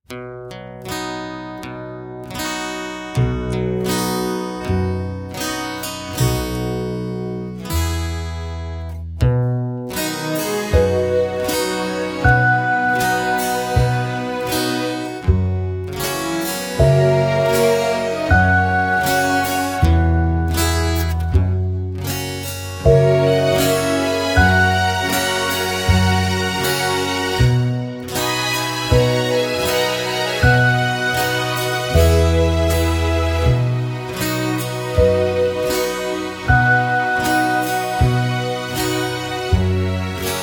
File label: (B) No Backing Vocals